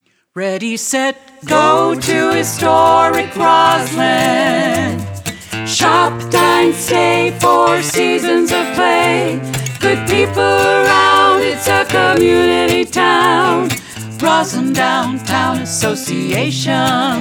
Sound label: Jingle; Roslyn Downtown